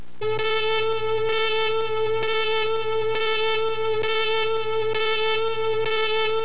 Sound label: red alert